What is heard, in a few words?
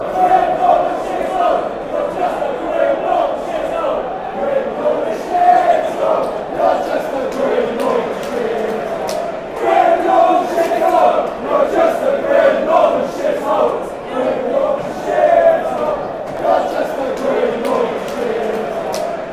grim northern hole, chant